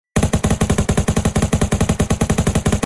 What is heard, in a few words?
ak all shots; ak shoot